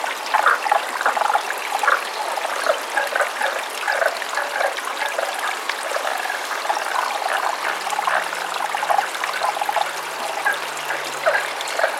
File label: Rana tagoi